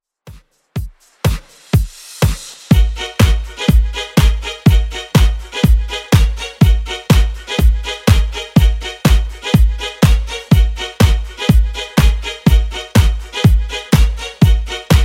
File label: 90s Redrum 123bpm